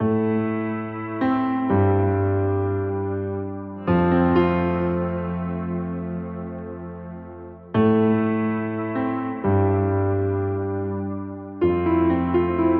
Touching Piano; dramatic

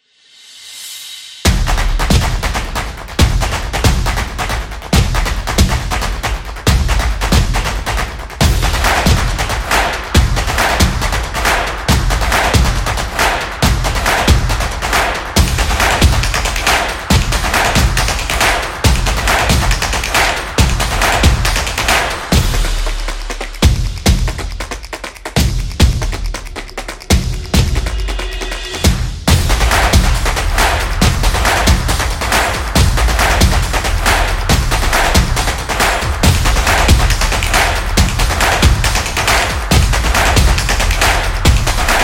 Stomp Percussion Energetic Fashion Sport

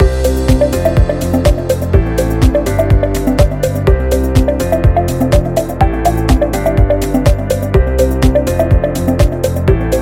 Information Loop Corporate

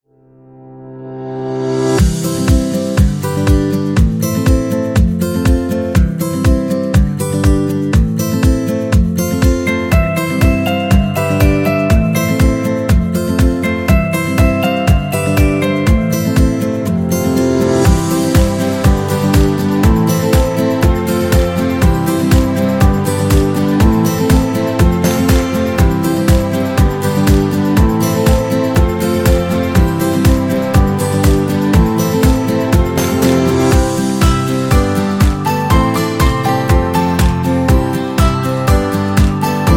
Upbeat Guitar Folk

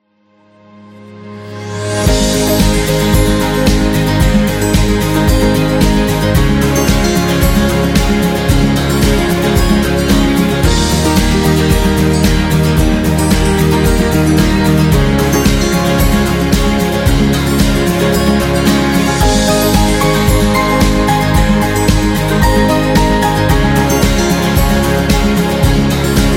Birthday Intro; cooking show

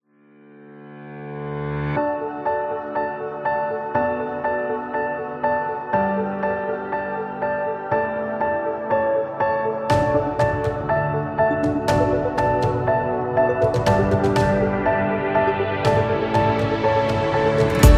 Emotional Cinematic, corporate music; corporate music vol 19

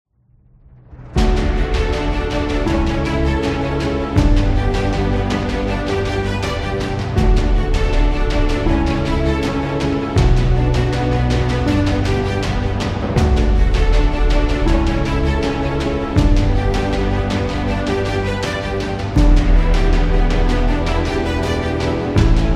Epic Positive; epic trailer

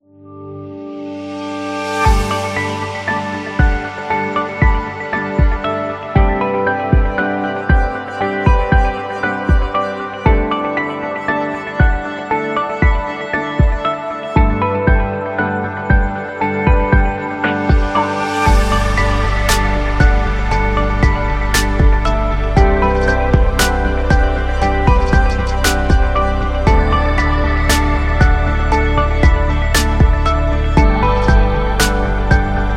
Chill

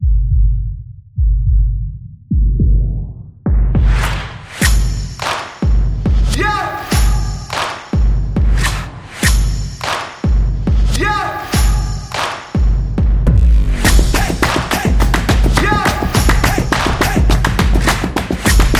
Hip Hop Drum

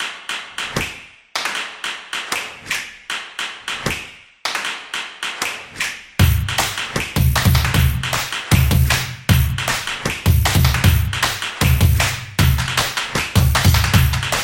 Upbeat Energetic

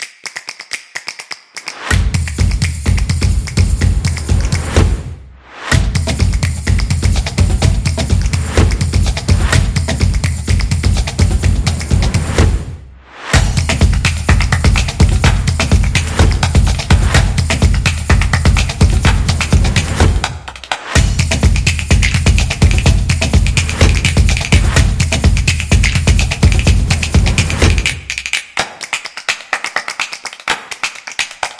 Trending Music, stomps power

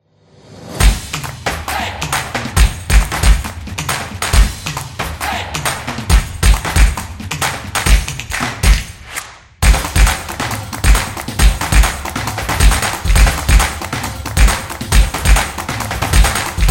Drumming Snaps Claps; graffiti

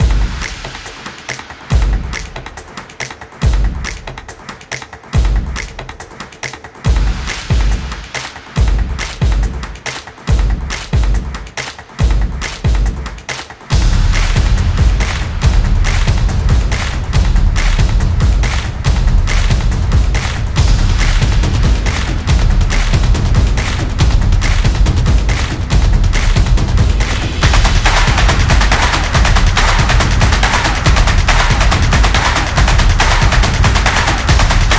Upbeat Drums

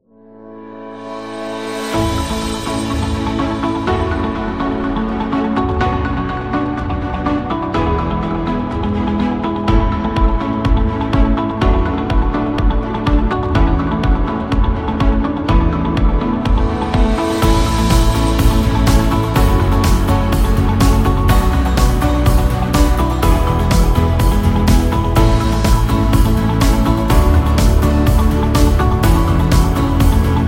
Fitness Motivation

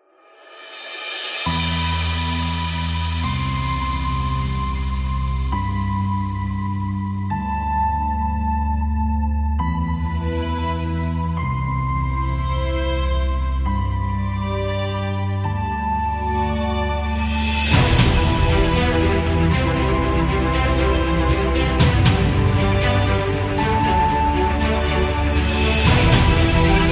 News Epic

